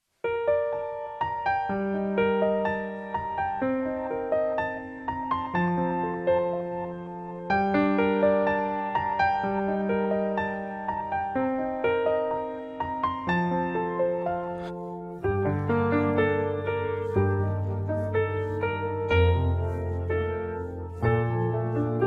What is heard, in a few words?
Instrumental Version